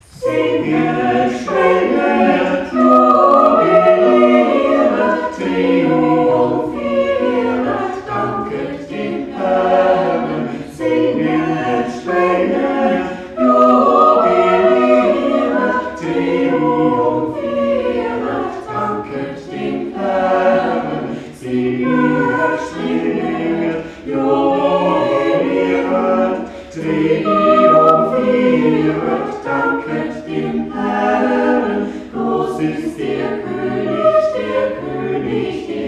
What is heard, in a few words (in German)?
Präludium Chor; Gottesdienste zum Nachhören